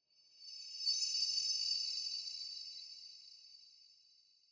shine, crimson forest, nether, ambient; minecraft

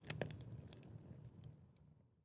fire crackle, furnace; block; minecraft

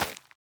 nether wart, plant; minecraft

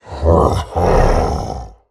celebrate, ravager; mob; minecraft

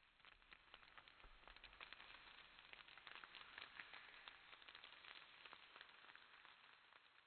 long debris, ambient, nether, basalt deltas; minecraft

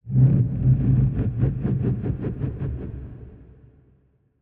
active, ambient, nether, basalt deltas; minecraft

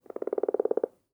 idle, frog; mob; minecraft

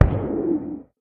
guardian hit; mob; minecraft

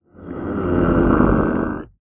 agitated, warden; mob; minecraft

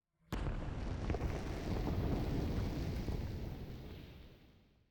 plode, basalt deltas, nether, ambient; minecraft

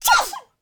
sneeze, panda; mob; minecraft

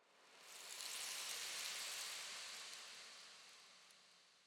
sand, soulsand valley, nether, ambient; minecraft

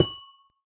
place, amethyst; block; minecraft

